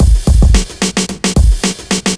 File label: block rock kit